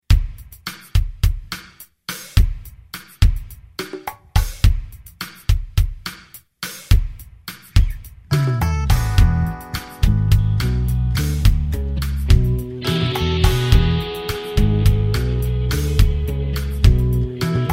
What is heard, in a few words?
R and B Funky Groove Thing